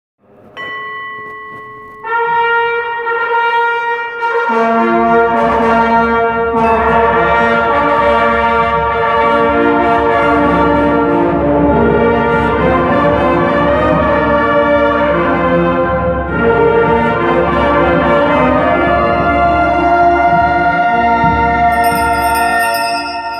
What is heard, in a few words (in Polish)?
Fanfary; w wykonaniu Tymbarskiego Tonu